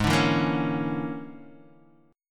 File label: A sharp 7th Sharp 9th Flat 5th, guitar chord; 4,5,2,3,2,x